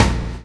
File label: CHAIN; Spectrasonics - Backbeat